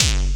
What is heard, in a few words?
kicks, Bass Drum